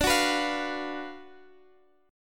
F sharp Major 7th Suspended 4th Sharp 5th D

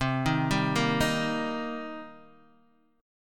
C Minor 7th C Guitar Open D

